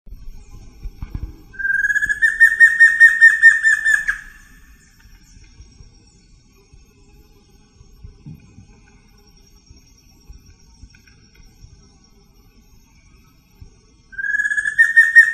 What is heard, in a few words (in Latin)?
Batara cinerea; PP Cruce Caballero